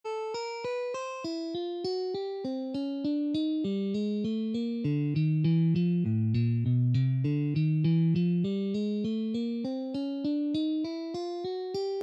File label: Guitar exercises